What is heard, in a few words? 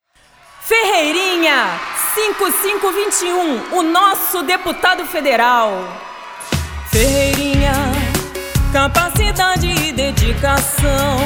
jingle